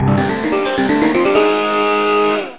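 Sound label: guitar fuzz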